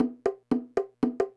175 D&B Drums; Dance DJ; Drum n Bass

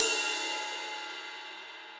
Ride Mid Direct Natural; Drums; Drum Hits; Standard Hits; Ableton; Session Drums Studio

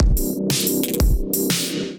Expo Kit, Drums; Ableton; Drive and Glow

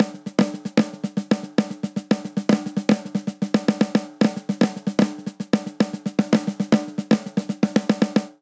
Polyrhythmic Dance Snare Only 114 bpm; MIDI Clips; Ableton; Factory Packs; Drum Booth

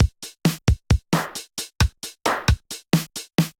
DMX Kit groove 133 bpm; MIDI Clips; DMX Admission Kit groove; Ableton; Factory Packs; Drum Essentials

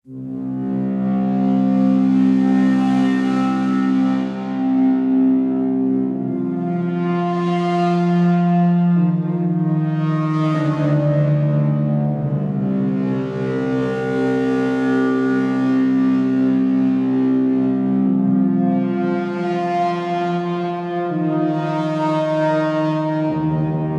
Solitary Drone - Cmin 80 bpm; MIDI Clips; Tonal; Atmospheric; Ableton; Factory Packs; Mood Reel

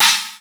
Cym; Drums; Ableton Foundation FreePacks

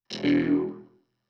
Neo-Wave Vocoder, Pop Rock

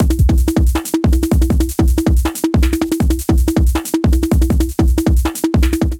Beat 160 bpm; MIDI Clips; Ableton; Factory Packs; Skitter and Step

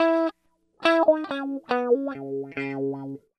Pop Guitar Wah, C#m, Electric; Pop Rock

